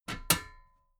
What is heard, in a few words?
metal ladder step regular clank